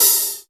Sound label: Cymbals HH Open; Vengeance Samples